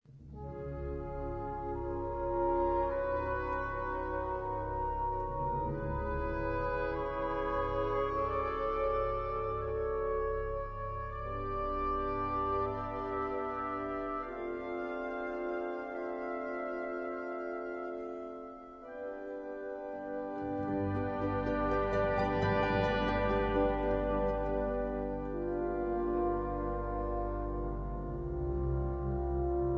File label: Credo Fis moll